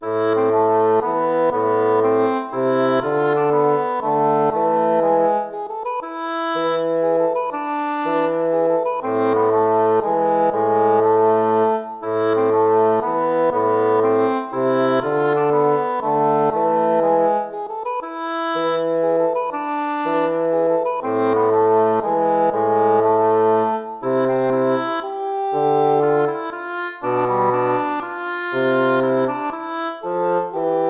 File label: baroque minuet minor